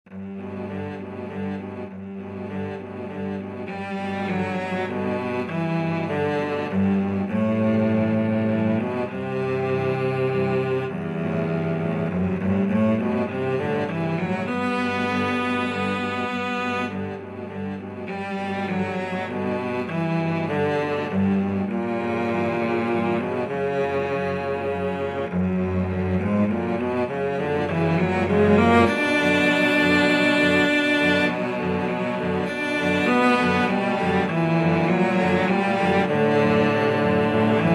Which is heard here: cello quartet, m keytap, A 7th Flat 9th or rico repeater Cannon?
cello quartet